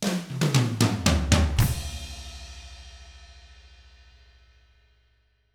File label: Drums, R N B 6-8; ILIO; Double Platinum Drums 1